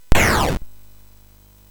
Frantic, MOS6581R4

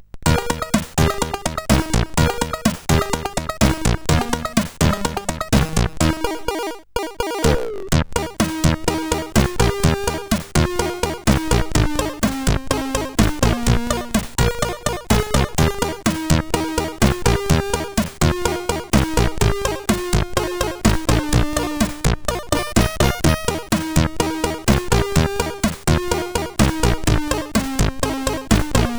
Loader Music, sid CSG8580R5

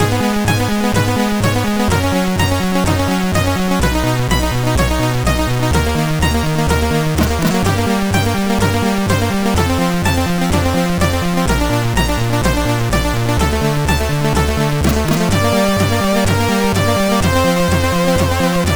Tube Madness, CSG8580R5

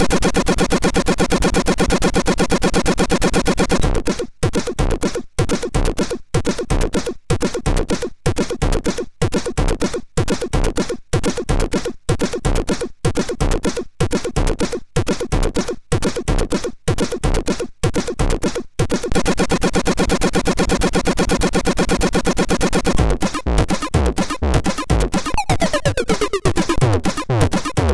Bum Bottom Bassline, MOS6581R3

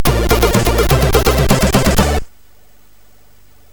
Drum em, MOS6581R4; hvsc

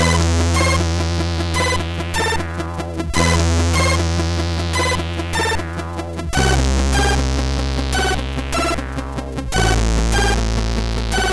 Soundbooster V1 mus5 remix, sid CSG8580R5

